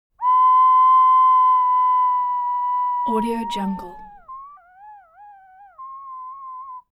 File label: musical elements ocarina collapse